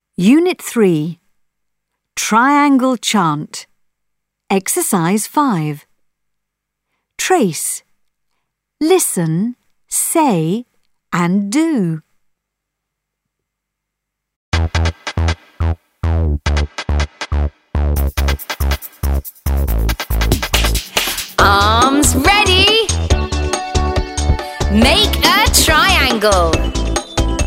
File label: triangle